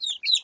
Chicken Idle; Farm Mania 2

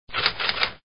Typing

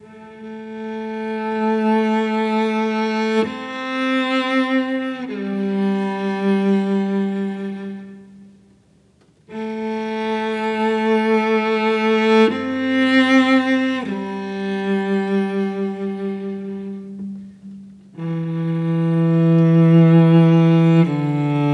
Music Level Sadness Layer Cello

